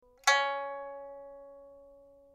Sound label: pipa; chuYinKouXian